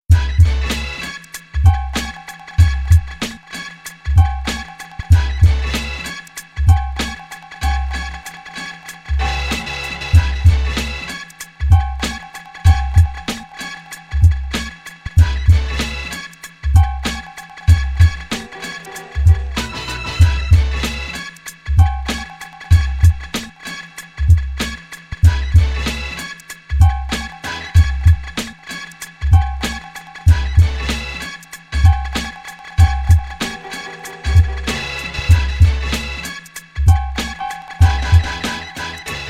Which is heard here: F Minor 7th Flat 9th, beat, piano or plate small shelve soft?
beat